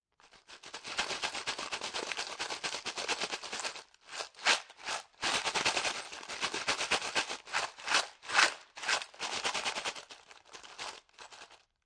Container Full Of Screws Shaken, Foley, Container, Plastic, Small Sized, Filled With Screws Being Shaken; Shaking